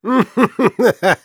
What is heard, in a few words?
laugh short, de; Kurzes lachen 2